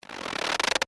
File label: Crunch Corn Starch, Bag; Texture; Crunch Sound Effects; Objects; Blast Wave